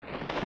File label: Flap; Guay; zelda; OOT